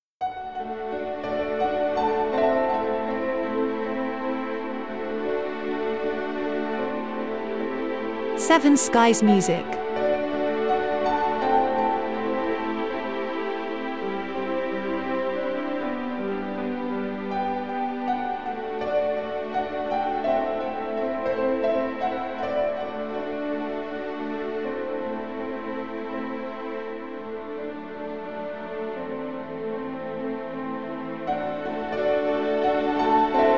Piano Moderato

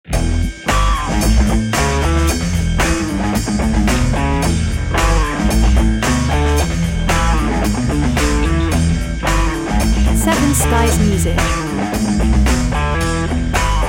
Old School Groove

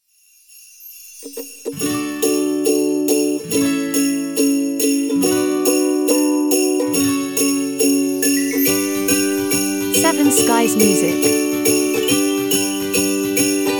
Christmas Ukulele Instrumental

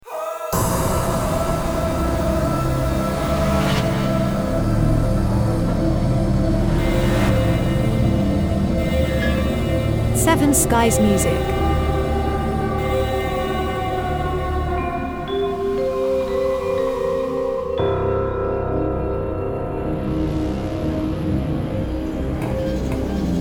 Dark Tension Trailer

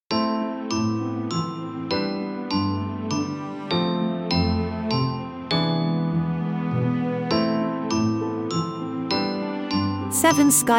Crescendo Stingout

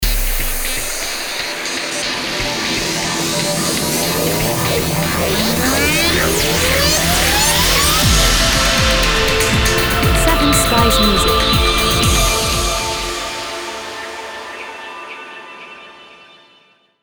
Tech Impact Logo

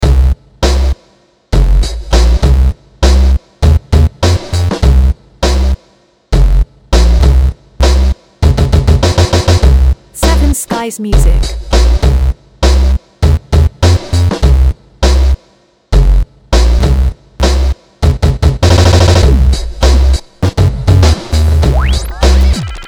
Electro - Crazy

